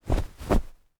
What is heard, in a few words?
Pillow Fluffing, Household